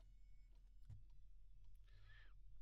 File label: Trumpet